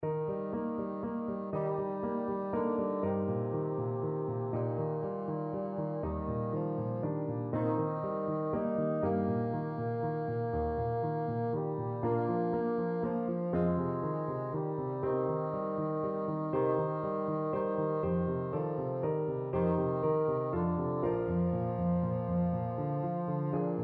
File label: puccini beloved father, bassoon